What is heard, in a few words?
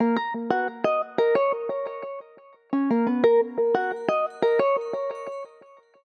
system ringtones abstract